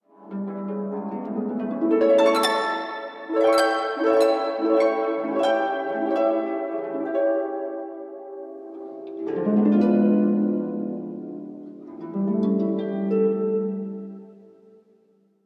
Flower Waltz; Google; Pixel-4; Seasonal Celebrations

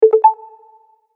Skip, notifications

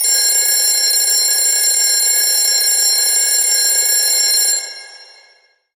Vintage Alarm; BlackBerry 9000-Bold

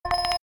camera focus, ui

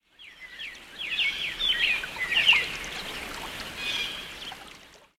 Bird with Stream, notifications

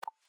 ui refresh feed

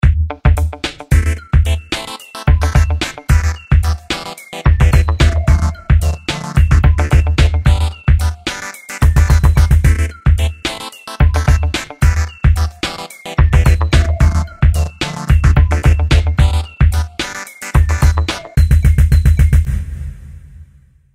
Electro Beat, ringtones; NGM WeMove Miracle

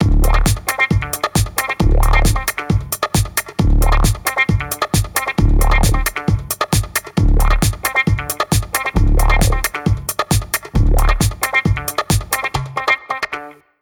Disco Night, ringtones; NGM WeMove Miracle

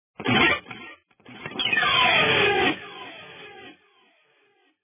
Alpha Riff; NIU N109 Niutek

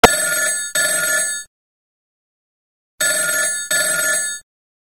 Chimey Phone; Spice; Mi-425; ringtones

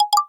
drumset, drums

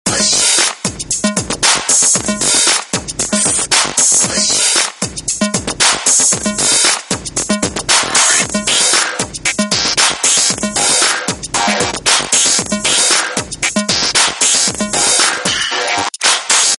Ringtone; Samsung; GT-S5233 Star

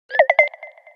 Alert low battery, Nokia Lumia 1520; Lumia 1520 South Africa

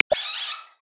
Masked Palm Civet; Xiaomi Redmi-Note-8-Pro; South West Mountain

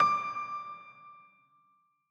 dial tone piano; OnePlus One China

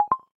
Cable Connect; LG; G3

